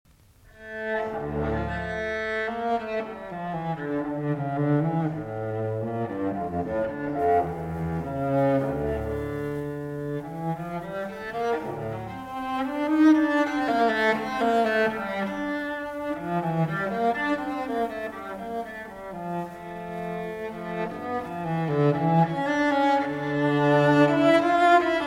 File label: Cello Suite No. 2 in D Minor, Allemande; Bach, JS; BWV 1008 II.